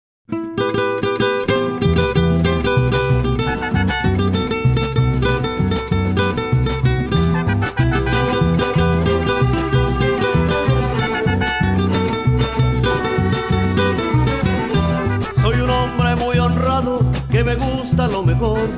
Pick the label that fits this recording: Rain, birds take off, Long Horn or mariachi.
mariachi